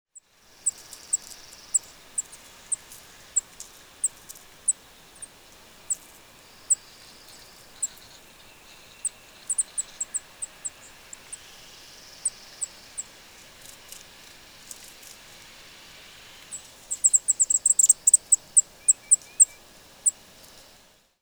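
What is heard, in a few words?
sounds of common savannah sparrow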